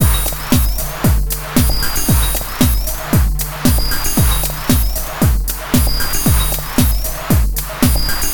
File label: 115 Bpm Stab Loop